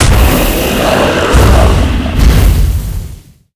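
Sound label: death, monster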